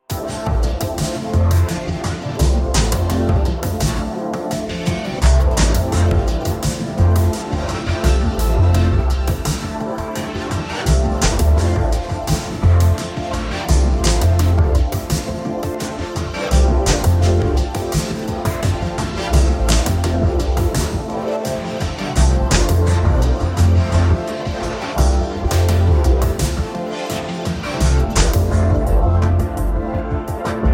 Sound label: tokyo future funk 1980s synthesizer nostalgic